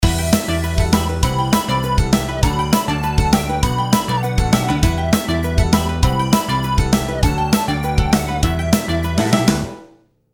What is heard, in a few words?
delay